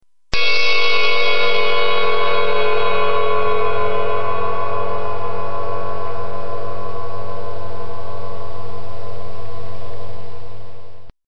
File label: bell splash